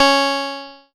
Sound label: C#, PIANO; Big Fish Audio; Synclav FM